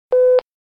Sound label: Walkie talkie turn on push button beep